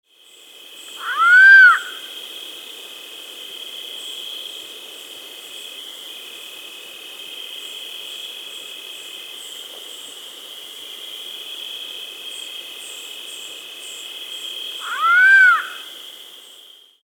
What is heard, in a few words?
Andean Potoo (Nyctibius Maculosus), Andean Birds; S. America